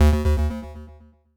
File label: error